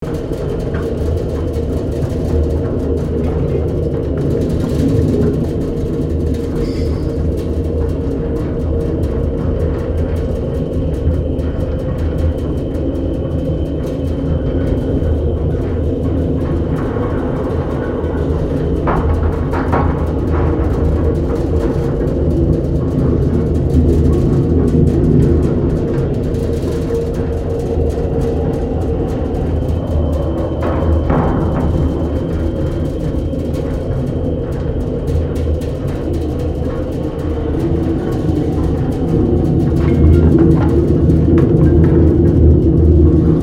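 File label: Ambience Blizzard Interior Small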